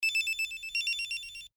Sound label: cell phone ring